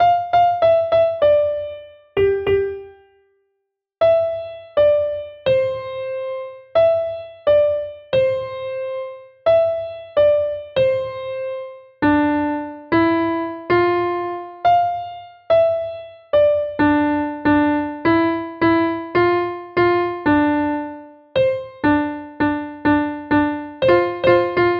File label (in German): Klavier